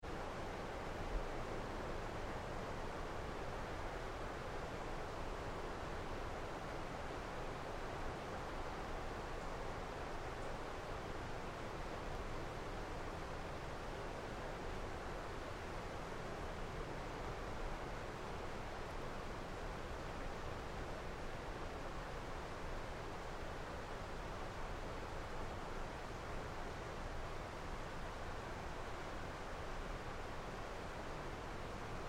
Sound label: Forest Air Ambience, Quiet day in woodlands, even breeze through trees; very distant river, no birds, just open air sound